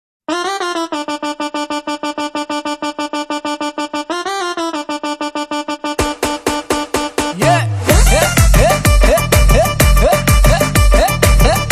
Starting Musik; Stree

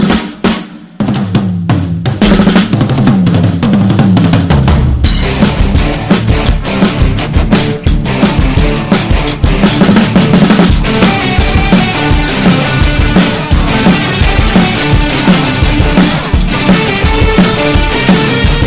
Instrumental BGM Ringtone; Vedam; Telugu

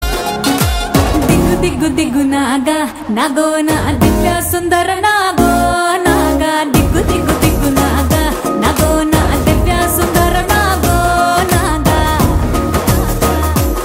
BGM RINGTONE, Telugu; Varudu Kaavalenu

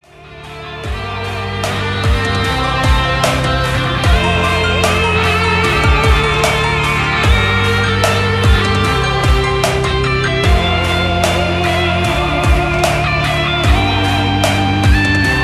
Instrumental

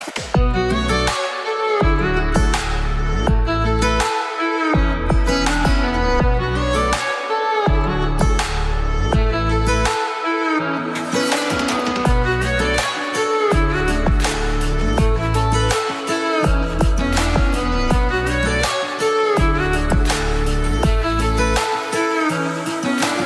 Instrumental bgm; Tamil

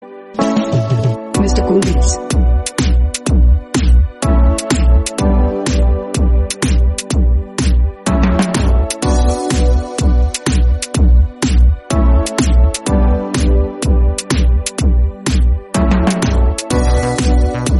Instrumental Bgm

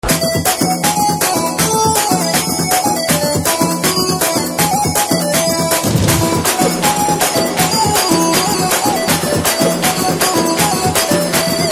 Instrumental BGM Ringtone; Krack; Telugu

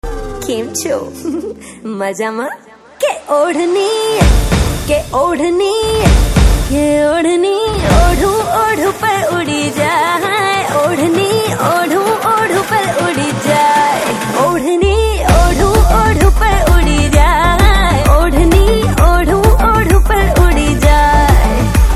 Ringtone, Hindi

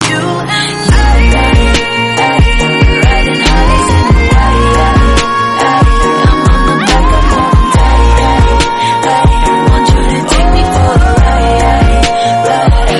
English Song Ringtone

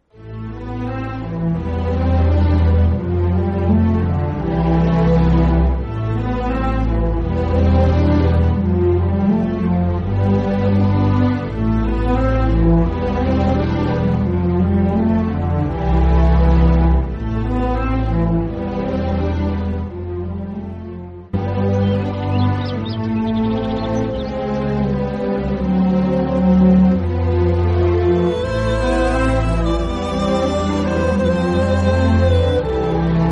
Emotional bgm, Telugu; Teenmaar

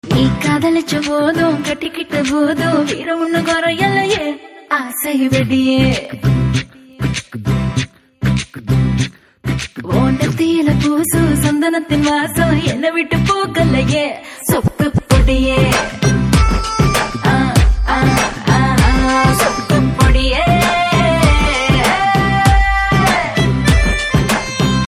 Female Version Ringtone; Saamy Square; Tamil

